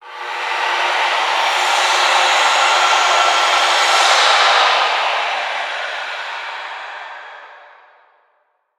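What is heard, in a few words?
Workshop Saw Distant; Prison Architect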